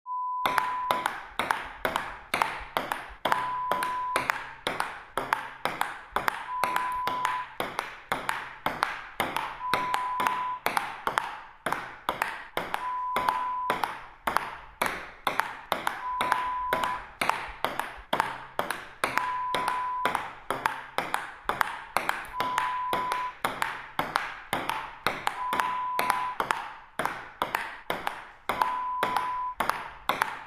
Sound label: Ping Pong Fast